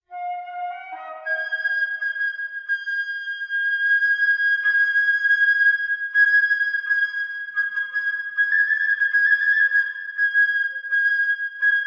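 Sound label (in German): Flöte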